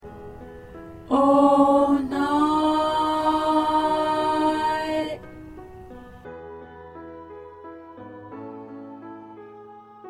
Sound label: Tenor; Tenor part